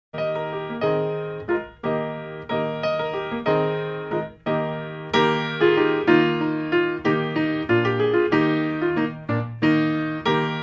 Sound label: Deck The Halls, Solo Piano